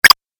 8 Bit Style Switch; Video Game Sound Effects; 8-Bit Style Switch 02

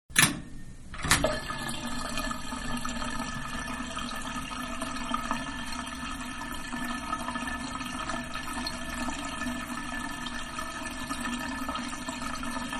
Tub Draining; Bathroom (Sound Effects:Household); Manhattan Production Music

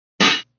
Clank Hit, Metallic

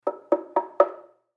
Cave Drapes Tap, Water Cave Drapes Tap; Water Sound Effects; Water (Sound Effects:Nature); Blastwave

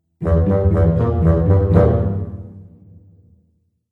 Cartoon Fun Musical Element

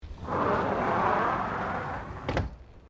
Door Sliding Exterior Aluminium Flyscreen Close; Various doors

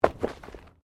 Body movement Impact Roll

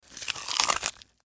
Human Bite Food; Bite Food Apple Food Eat Crunch Rip Tear; Eating

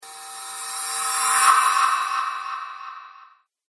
Reverse Cymbal Whoosh; Whoosh Sound Effects

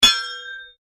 Strike Metal On Metal Heavy Ring; Foley sounds for melee weapons; Materials

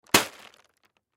Tarp Plastic Folded Up Drop Cement; Materials; Industry; Blastwave

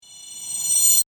Ramp High Pitch; Ascends

